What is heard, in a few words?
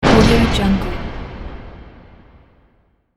deep reverberant metal smashing impact; sound-ideas